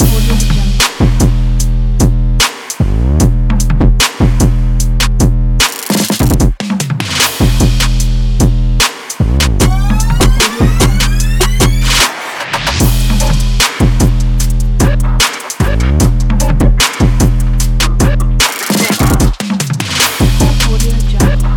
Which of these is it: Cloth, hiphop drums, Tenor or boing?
hiphop drums